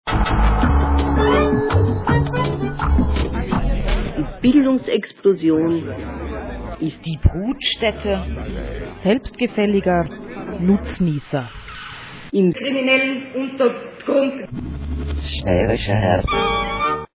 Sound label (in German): der jingle